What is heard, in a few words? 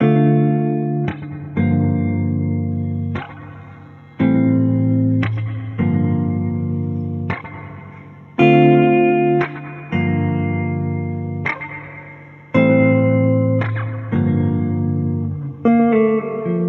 Pop songstarter 115 Dm; SD PASADENA Pop Sample Pack; Slate